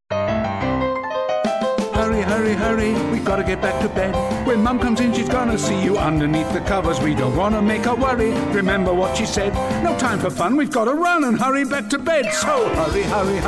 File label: Hurry Song